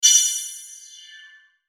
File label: Sweeper Burst Flange High; Production Element Imaging Element Accent Transition